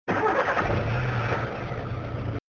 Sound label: engine starting